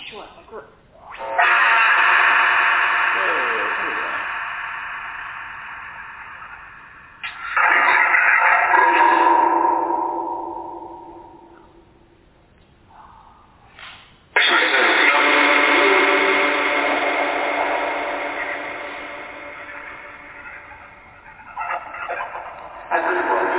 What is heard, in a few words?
Phasma